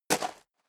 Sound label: step leather shoes gravel medium single right; schritte